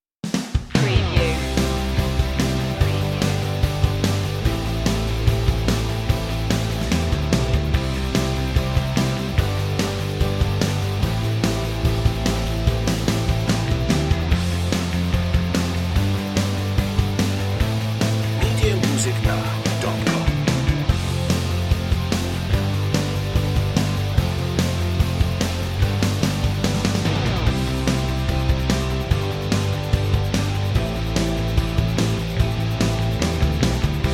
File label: rockin no melody